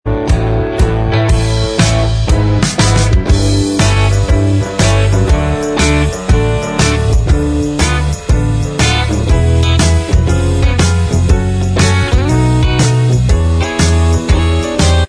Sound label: Inst Track